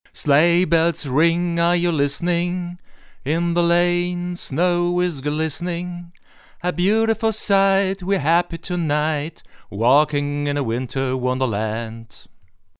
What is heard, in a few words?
WarmAudio WA-8000 Vocals